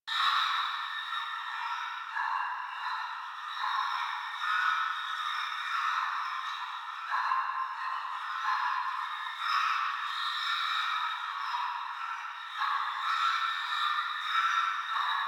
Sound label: Lair Chatter Loop Interior; Warframe; Ambience; Sentient